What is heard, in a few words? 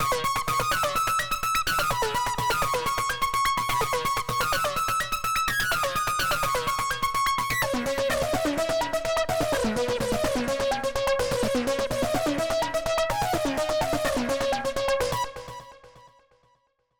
Lead; absynth6; Tahunanui